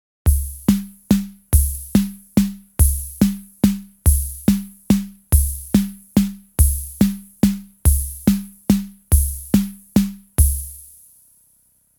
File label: RHYTHM WALTZ